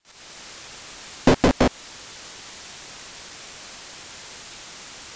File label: weatherstation; rtl2832